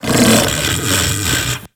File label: Kubrow Tame Sleep Idle Snore B; Warframe